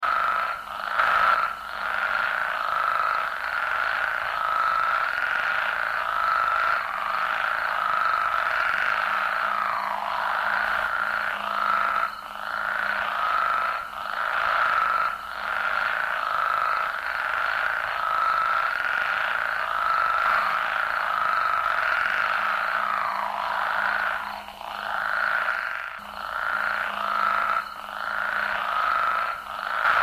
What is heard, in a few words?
frogs new mexico spadefoot